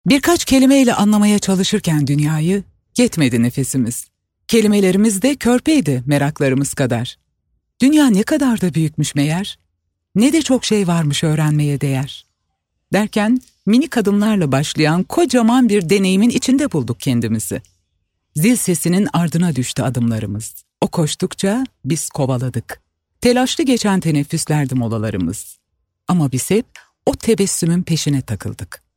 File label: jingle